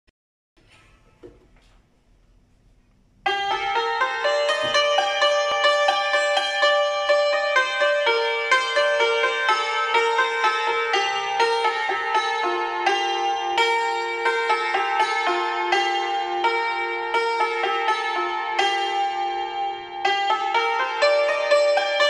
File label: Santur, سنتور